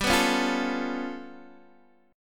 G 9th Flat 5th, Guitar Open D

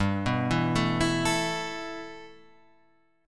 G 6th Add 9th, Guitar, Half Step Down

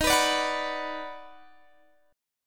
Eb Minor Major 7th Sharp 5th, Guitar Drop D

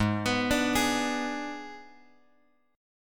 G sharp Diminished; G#dim Chord {4 X X 4 3 4} arpeggiated

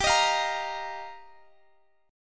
G Minor 7th Sharp 5th G down Guitar Open G

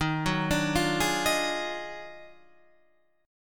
D sharp Minor Major 11th, Guitar, Standard; arpeggiated

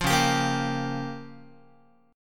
Db 6th Flat 5th Db down Guitar; Db6b5 strummed; JGuitar

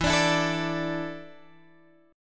E 7th Suspended 4th, Guitar, Open G

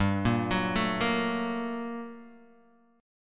G Minor Major 9th G, Guitar, Open D; chordshape; down

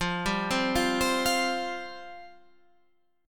F Suspended 2nd, Guitar, Half Step Down

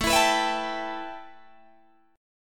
A 7th Suspended 2nd A, Guitar DADGAD; A7sus2; strummed

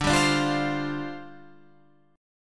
G 7th Suspended 4th D

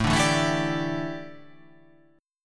A 7th Suspended 4th, chord

